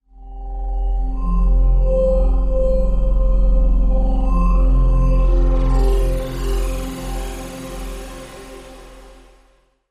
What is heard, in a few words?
Space Ferry